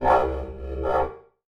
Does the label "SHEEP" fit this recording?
No